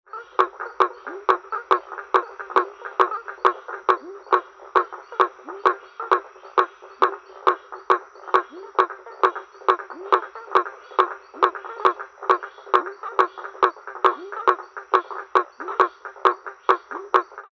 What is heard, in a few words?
Boana faber